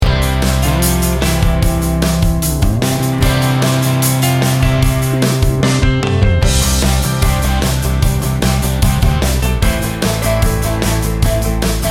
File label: classic corporate rock bed with piano